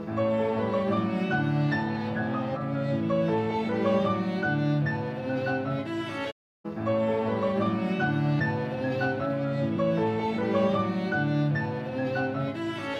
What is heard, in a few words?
Correcting wrong notes before and after fix